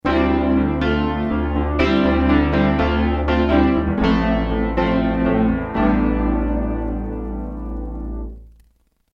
Sweet Piano

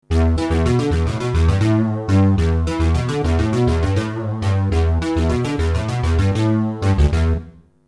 new analog

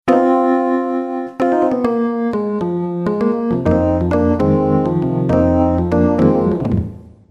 Wurli in my Studio

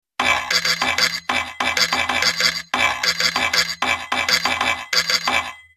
Latino Tempo

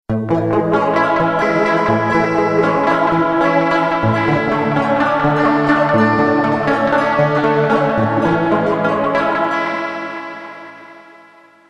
Guitar

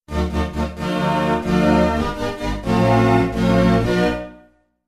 E-MU III STRINGS

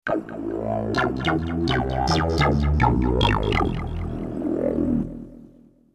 Is It My Bass

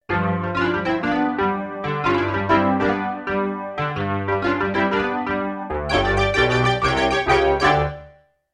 fuzzy Wurli

